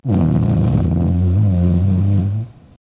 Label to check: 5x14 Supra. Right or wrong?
Wrong